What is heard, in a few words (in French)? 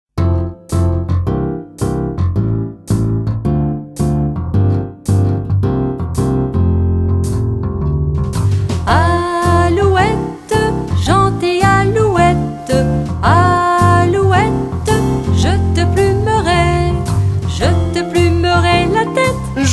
Alouette Gentille Alouette